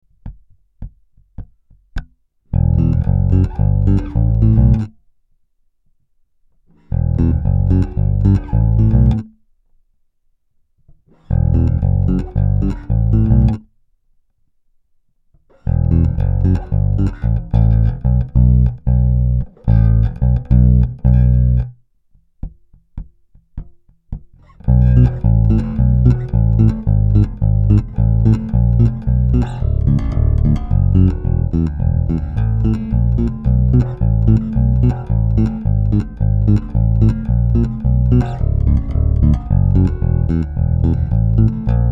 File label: bass samples